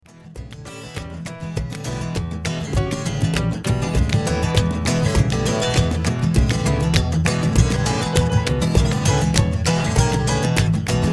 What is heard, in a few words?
Jam